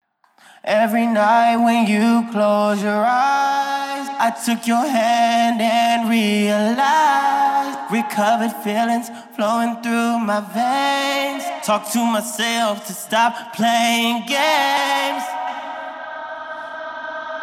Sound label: Acapella; 128.0 BPM